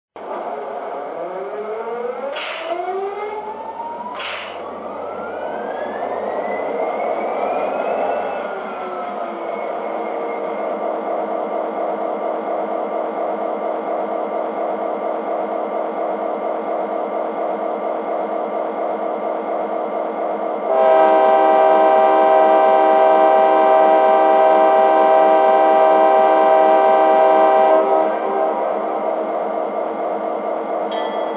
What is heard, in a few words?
SD45, electric trains